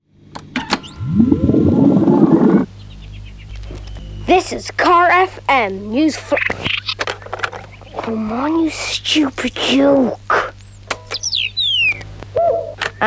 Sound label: Car FM Chirps and Tape FX; This is Car FM